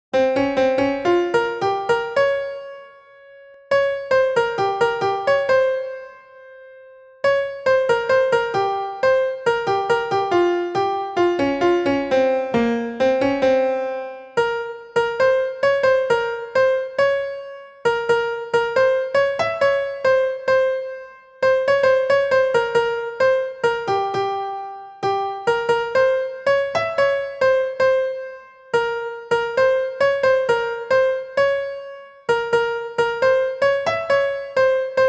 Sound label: piano tamil songs piano cover devotional piano; piano bgm; srinivasa govinda